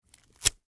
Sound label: Rope Cut Nylon, Industry, Rope, Cut, Nylon, Rope; Hand Tool; Tools; BlastWave